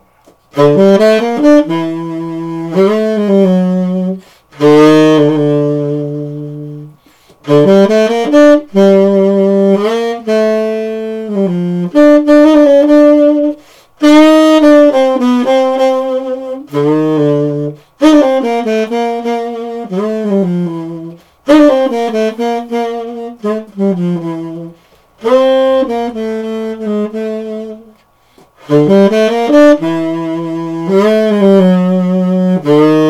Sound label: jazz